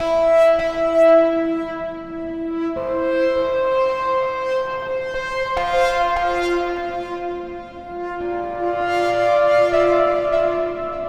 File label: Keys, Drum n Bass; KJ Sawka; Drum n Bass & Beyond